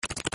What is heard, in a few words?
Deal Hand